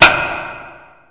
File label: homerunbat stab; cstrike; knifeapi